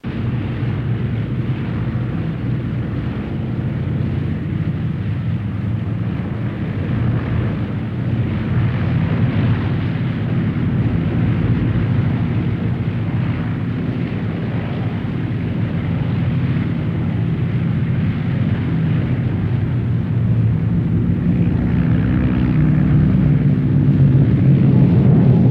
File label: B29 Bomber Land Lng, b-29 superfortress; B-29 Bomber Landing Long In Wheel Hit